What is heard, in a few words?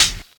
smooth pedal hi hat sound B key